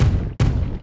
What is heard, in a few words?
walk act, fire man, monster